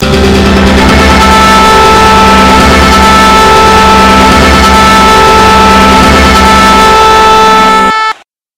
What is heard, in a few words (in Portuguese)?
suspense